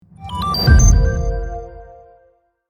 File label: Podcast intro outro sonic branding